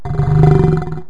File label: roar